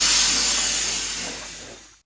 Goz; Pure Pinball

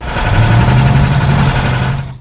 tank motor; Pure Pinball

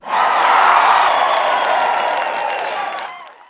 tomeg; Pure Pinball

